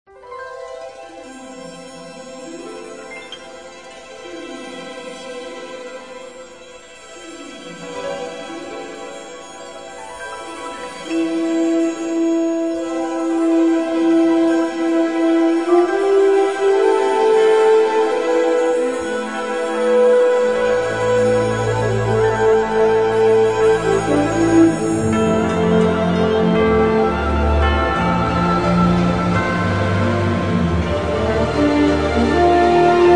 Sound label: Orchestra Panpipes, How Great Thou Art